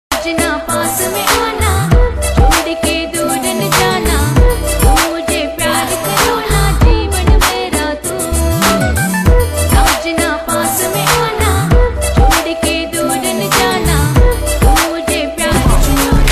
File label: Mix Ring